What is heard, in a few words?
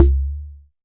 Jomox MBrane, drum machines; Jomox MBrane 11